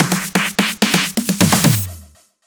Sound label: Fills 128BPM, Clubsounds